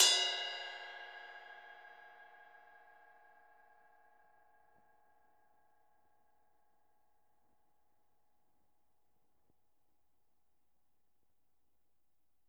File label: ride room; Q Up Arts; Denny Jaeger Private Collection; CYMS GM ROOM